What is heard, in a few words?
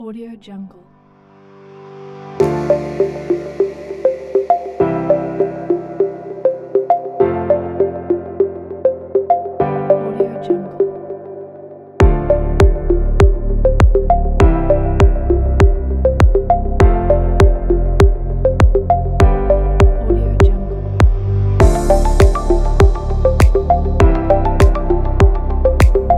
future artificial intelligence technology kit